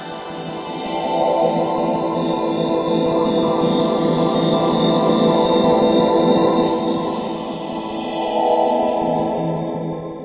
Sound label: all glitter and shimmer pad; Pads